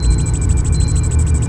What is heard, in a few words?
beep slow; cstrike; de japan